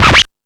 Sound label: Discoball, Drum Machines E-MU Pro-Cussion